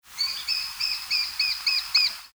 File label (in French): epervier a gorge rayee